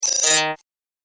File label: Scratch That Robot, Robot Sound Effects; science fiction sound effect